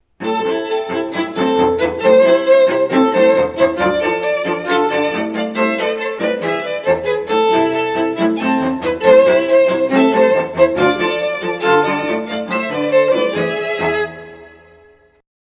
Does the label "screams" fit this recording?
No